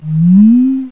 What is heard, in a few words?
Wooizz